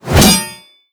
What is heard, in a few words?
wave attack; Seer; GamigoNA